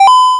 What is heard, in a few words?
passive building; tanks of freedom; units; buildings